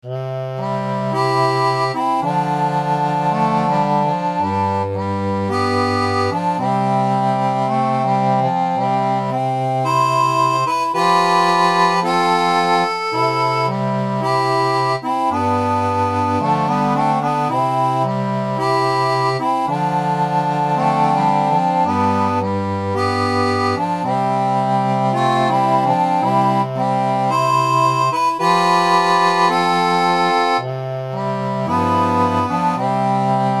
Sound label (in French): seraphone, orgue barbarie